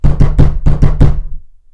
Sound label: open the door; horror